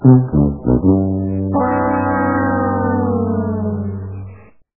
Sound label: Loser; Price Is Right Loser